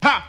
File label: "ha", Voice